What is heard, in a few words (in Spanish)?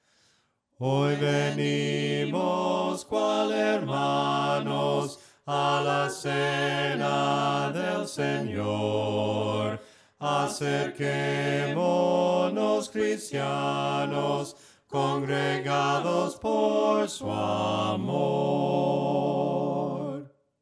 VOCES, himnos a capella; cantos del camino; HOY VENIMOS CUAL HERMANOS